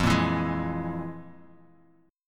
F Minor Major 7th Double Flat 5th, F, Guitar, Drop D; 3,1,2,1,x,x; down; chord shape